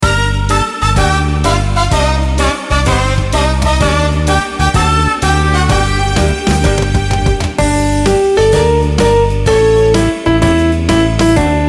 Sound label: karaoke